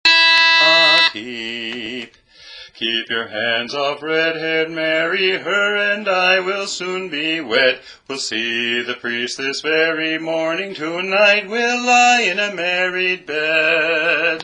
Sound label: Red haired Mary (Melody); learning tracks